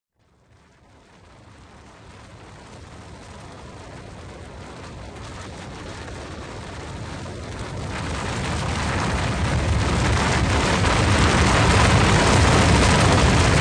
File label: Beeps; 헐리웃특수효과음; 신호-경적